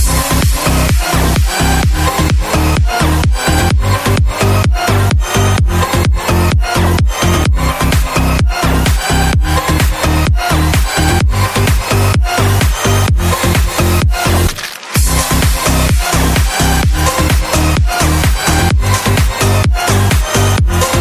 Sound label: EDM Remix; AMONG US